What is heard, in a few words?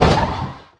crash; Wacky Races - Sling Races; NextlevelGames